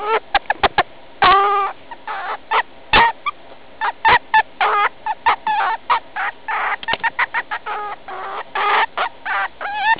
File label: whine, ferret